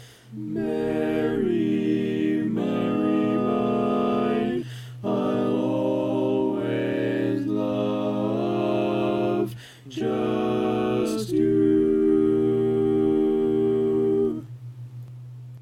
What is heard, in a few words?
Bass, barbershop tags